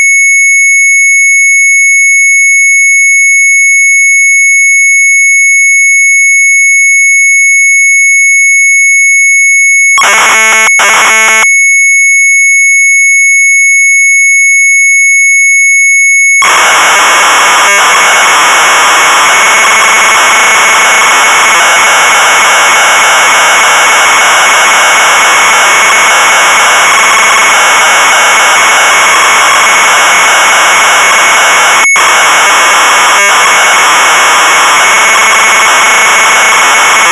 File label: Sharp MZ800 Tape; AFSTAND; retromelsarchive